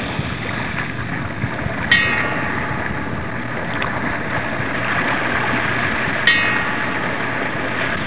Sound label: port